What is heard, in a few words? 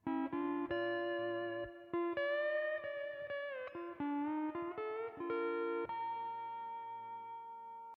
hawaiian piece